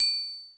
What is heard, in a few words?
TRIANGLE; Univers Sons; Basicussions